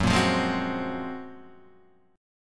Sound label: Gb Major Sharp 11th, Guitar Half Step Down; GbM#11 strummed